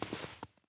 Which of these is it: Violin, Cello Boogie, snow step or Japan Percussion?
snow step